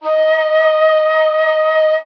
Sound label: flute; Winds